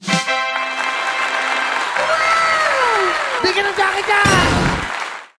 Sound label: congrats